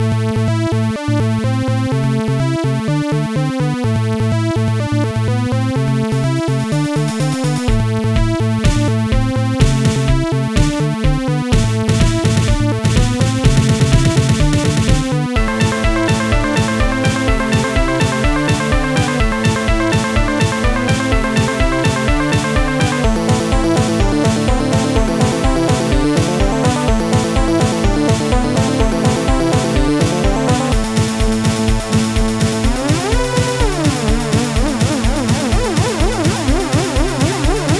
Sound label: Gameover; 3D World Boxing; amiga